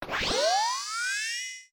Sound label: spider boss spawn voice